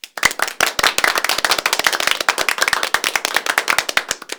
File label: H CLAP, SMALL CHEER; 1000fx